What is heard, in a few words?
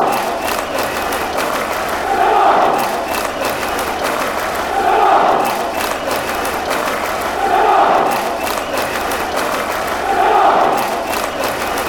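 classic, football chants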